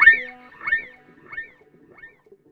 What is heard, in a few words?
squeek